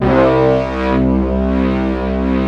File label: big disto; East West - Global Sounds